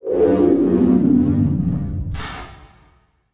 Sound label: Transition Whoosh Warble; Production Element